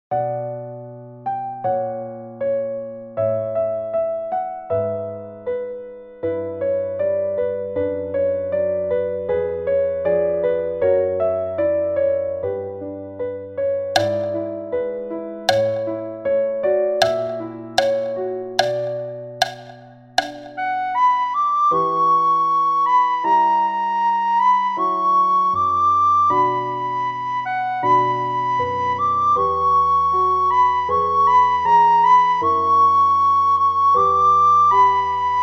sopraan

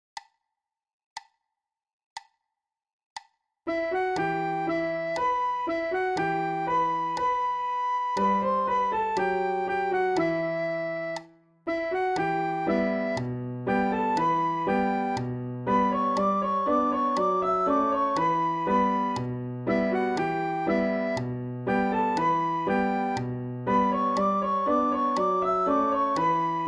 sopraan; Blokfluitles in Utrecht

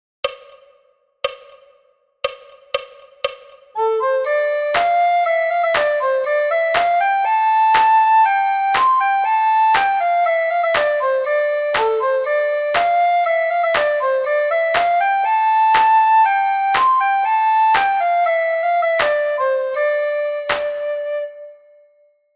bamboe alt snel tempo; Tureluurs 3

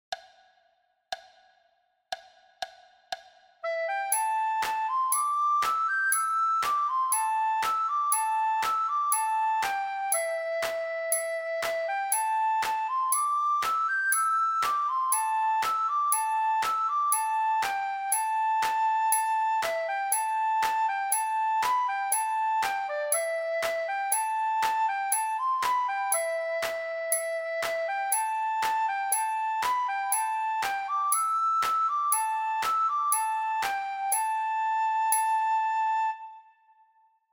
sopraan; Flierefluiter 6

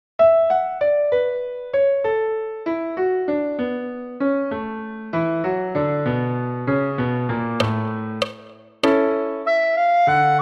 sopraan; Flierefluiter 6

